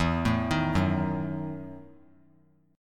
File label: E Major 7th Suspended 2nd Suspended 4th E; arpeggiated; 3C,3F,3A,3D; JGuitar